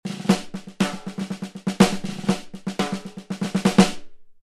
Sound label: tight; brady